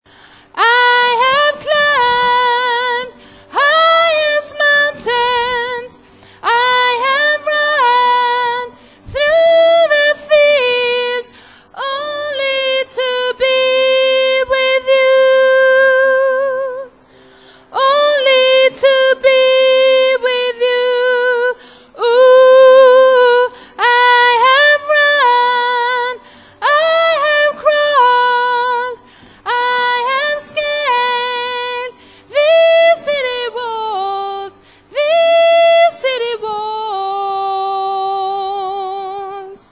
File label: tenor